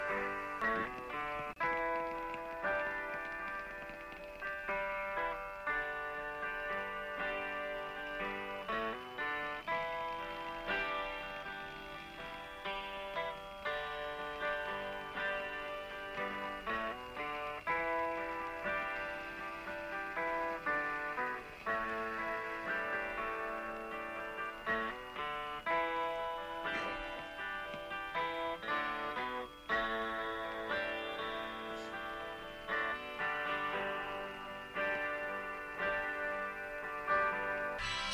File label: intro solo backing track